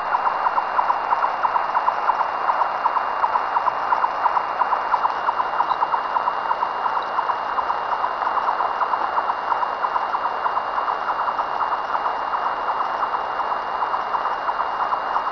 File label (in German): rothalsziegenmelker